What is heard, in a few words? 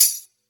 shaker a key; percussion